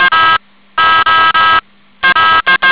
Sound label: Morse